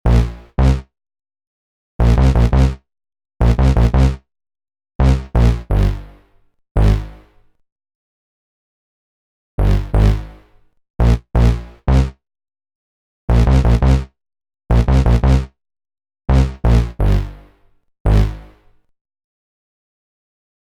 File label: Dancing Mutation, analogue stab